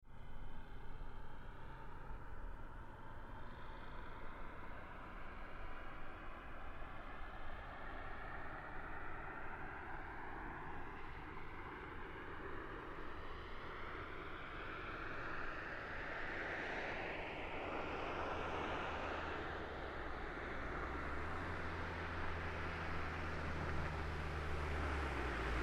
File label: Car Smart Car Up Stop Med; 2005 Smartcar Fortwo; Vehicles; Big Room Sound